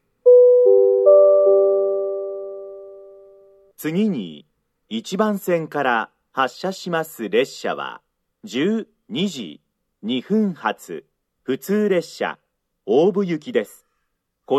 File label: local, delay; kiyosu; obu; kisogawa; 5minutes delay; jr-central